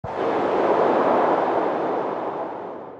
smokey screen; Forest Nymph

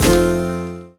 stop scatter on reels; Wild Guns

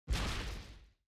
boom; Thunder Fighter

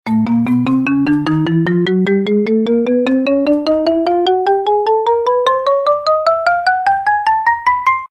WIN COUNT UP; Roaring Forties

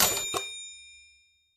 coins collect; games; Aztec Gold Megaways

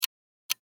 Countdown; One Shot Fishing

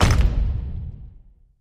respin drop symbol; games; Aztec Gold Megaways

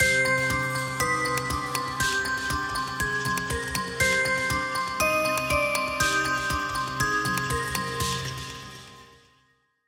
spin long ambient; Great Book Of Magic Deluxe; Wazdan

